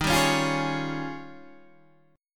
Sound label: D sharp 11th D sharp